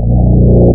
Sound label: Teleport; Realmz 6.0.3; The Family Jewels